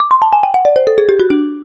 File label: music scale; Samsung GT-i7680; cmcc